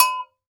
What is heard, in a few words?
GANKOKWE, African Perc; E-MU Producer Series Vol. 7 – Old World Instruments